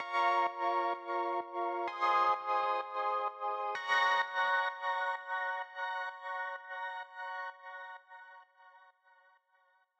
PIANO

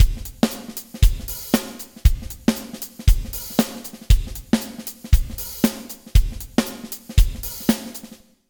fx small room; alto zephyr zmx124fx usb

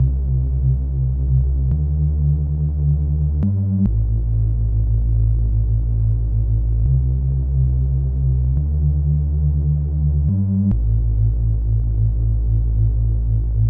osc a b sub

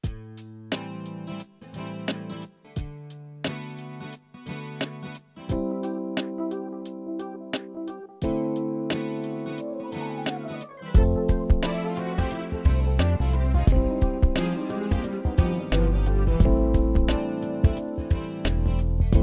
guitar pop; Korg i3; Korg i3 Test

